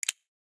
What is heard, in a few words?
button; Samsung; SGH-T939 Behold II